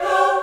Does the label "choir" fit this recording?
Yes